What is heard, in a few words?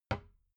cabinet door close soft